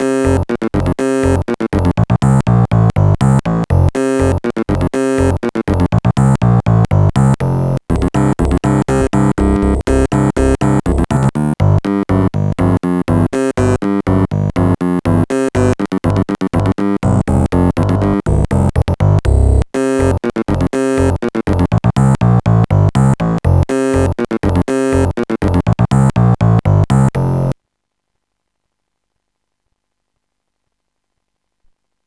musette; Sonix Music Driver

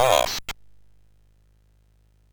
sid MOS6581R2

